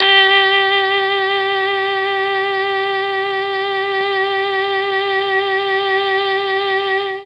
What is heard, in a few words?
G3 MKII SAX, mellotron